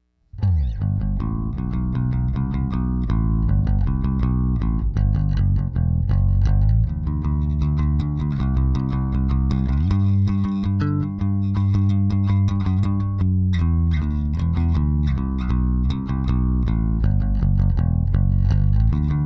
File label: Bass